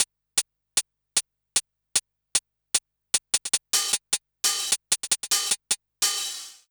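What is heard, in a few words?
COO hi-hat, drum loop; Big Fish Audio; Uncivilised G'rooves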